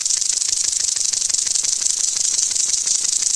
snake loop; There; ThereClient

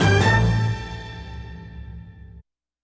Stab Orchester Energy; One Shots; Ableton Live 12 Lite; Core Library

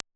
Focusrite ISA, Reverb; FL Studio 9; Fruity; Edison

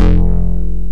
Instrument Jazz Buzz; Ableton Live 12 Lite; Vector; Sprites

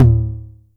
Tom 909 Lo; Drums

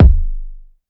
Kick Twang

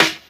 Snare Impact Skitter

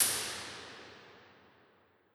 Real Places Copyist Room; Noirlac; Impulse Responses; Ableton Live 12 Lite; Hybrid

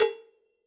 Cowbell Big Stick Dry Session; One Shots; Drums; Ableton Live 12 Lite; Core Library

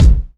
Kick; Ableton Live 12 Lite; Core Library; Drums

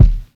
Kick; Ableton Live 12 Lite Core Library

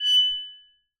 Arco Metal Attack Medium F#5; Multisamples; Sound Objects; Ableton; Live 12 Lite; Core Library

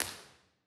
Early Reflections Town Hall L; Beer Sheva; Impulse Responses; Ableton Live 12 Lite

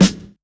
Snare, drums; Ableton Live 12 Lite Core Library

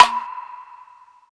Clap Wood Block; One Shots; Drums; Ableton Live 12 Lite; Core Library

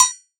Bell Mid Metallic Electrified; One Shots; Drums; Ableton Live 12 Lite; Core Library

